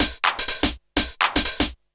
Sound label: 124bpm, House Perc Loops